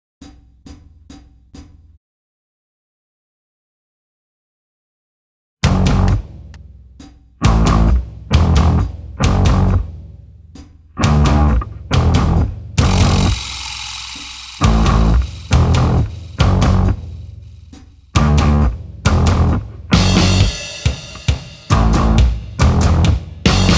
Guitar Backing Track Version without vocals